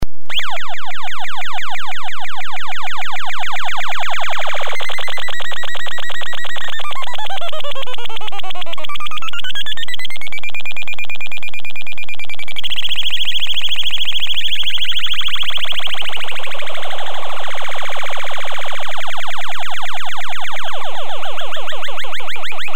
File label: space gun